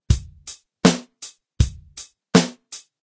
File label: rhythm